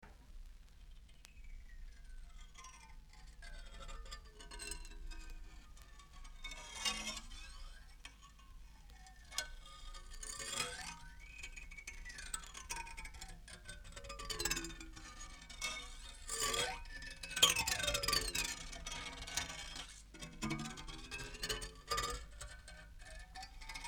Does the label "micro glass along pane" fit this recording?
Yes